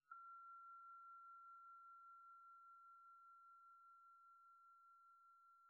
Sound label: singing bowl; pasmo P05